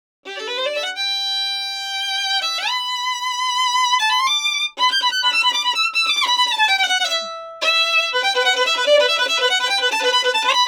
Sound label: Ascending 144